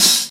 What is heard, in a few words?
dampened open hi hat one shot b key, open hi hat one shot tuned to the B note